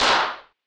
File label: CP Clapernicus Clap, DRUMS; Polyend; Legowelt